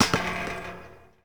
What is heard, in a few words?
Street Ball Metal Back, Street Basketball; SFX Kit